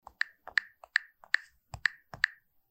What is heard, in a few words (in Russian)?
Звук набора пароля на iphone